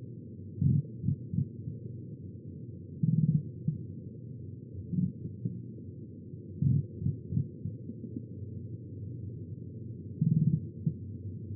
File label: daytime clear rumbles amplified